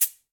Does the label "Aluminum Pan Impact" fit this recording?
No